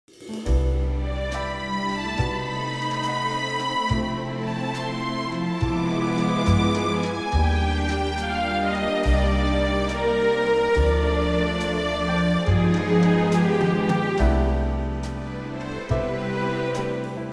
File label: C orchestral